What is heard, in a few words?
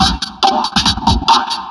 Breakbeat; Vengeance Samples; VEC2 Loops